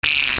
BIRDS

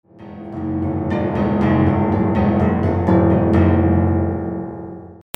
MUSICAL

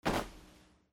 FALLING

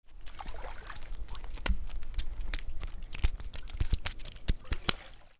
WATER

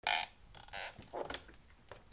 SQUEAKS

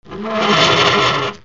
FRICTION

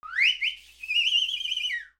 WHISTLES

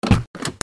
CARS

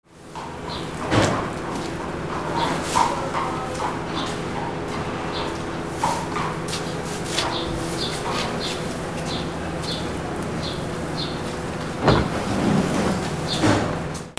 ANIMALS

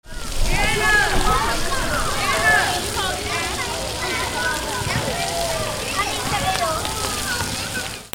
PEOPLE

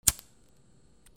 FIRE

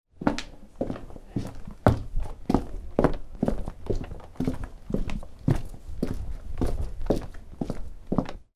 FOOTFALL

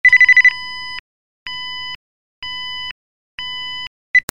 PHONES

